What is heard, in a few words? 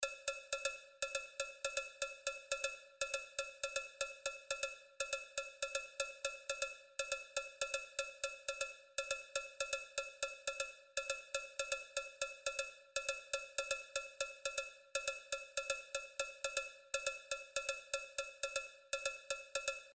cowbell